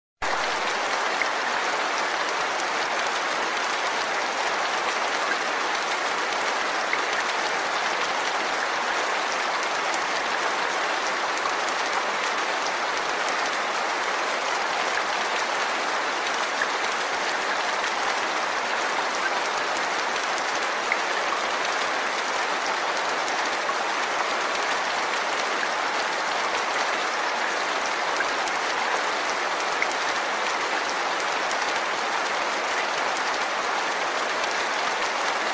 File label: Relaxing Rain; Music; Soundscapes